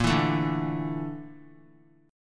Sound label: A sharp Major 7th Flat 5th, down, Guitar; strummed; 4F,4A,5C,5G,5C,6E; JGuitar